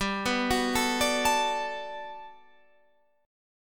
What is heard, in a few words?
G Major 9th, Guitar, Standard; GM9 arpeggiated; JGuitar